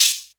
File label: reggae open hat